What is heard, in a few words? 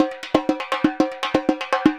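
repinique, DRUMS SAMBA; Best Service ProSamples vol.14; AKAI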